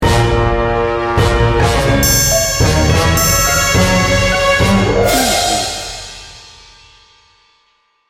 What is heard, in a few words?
sneaky creepy sting bumper